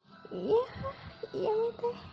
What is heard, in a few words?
ahh m